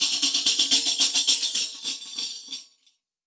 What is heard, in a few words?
tamb, percussion; WORLD PERCUSSION; MIXED PERCUSSION; Best Service ProSamples vol.52; World Instruments 2